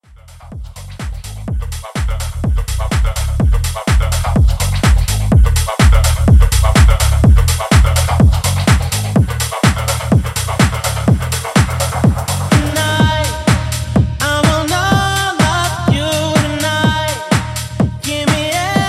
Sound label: Mashup; Remixfreakz